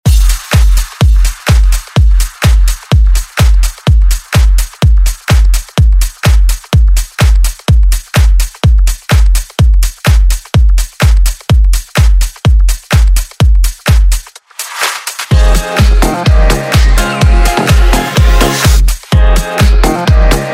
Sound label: Dance Ext Redrum